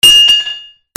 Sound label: FALLING